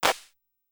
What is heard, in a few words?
CLP, Sonic-Potions LXR; CasioPop